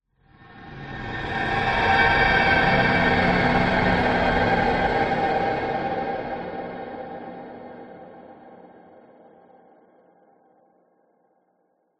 horror swell pass by ghostly drone; zapsplat

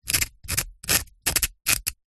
rock knife tip scrape scratch; foley

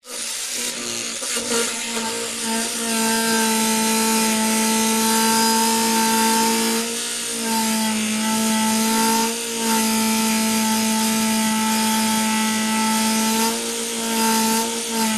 industrial power tool grinder on metal buzz

